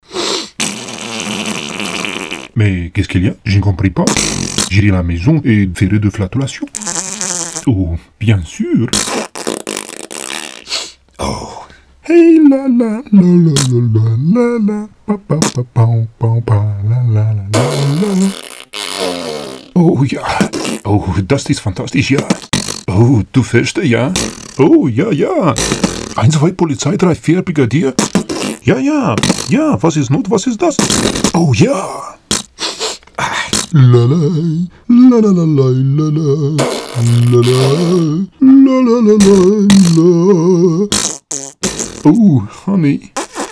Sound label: wc show loop; ambient; cstrike